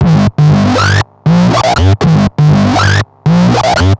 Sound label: Analoop, Industrial